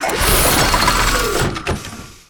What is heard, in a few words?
Enemy Factory Active Loop